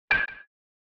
Baseball Metal Bat; SNES

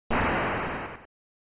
General Noise Single

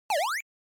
NES General Pitch

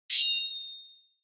Onoma Flash High Short

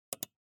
PC Mouse

